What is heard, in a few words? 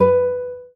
Classic Guitar, notifications; Oppo Find X5